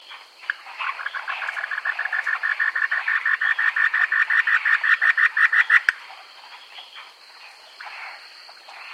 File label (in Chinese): 褐樹蛙, frog